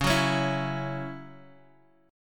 C sharp Minor Double Flat 5th; strummed; x,x,x,6,6,8,6,x

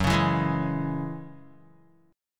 F sharp 7th Suspended 2nd F sharp down Guitar; F#7sus2 strummed

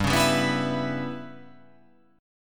G 6th Flat 5th

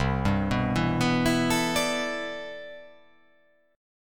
C Major 13th C

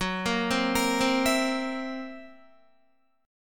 F sharp 7th Flat 5th Guitar, F#7b5 chord; arpeggiated; JGuitar

